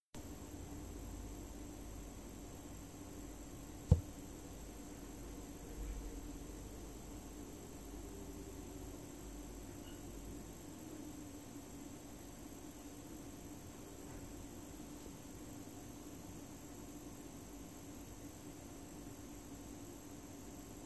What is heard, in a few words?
PowerWalker VI 600 CSW rumore